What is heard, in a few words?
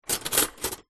household stainless steel cutlery movement in drawer; zapsplat